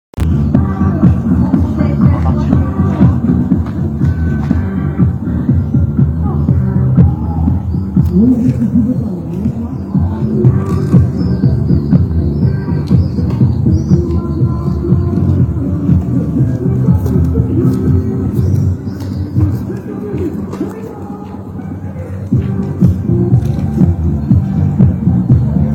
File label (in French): son camping, nuisances sonores; table ronde nuisances sonores troubles du voisinage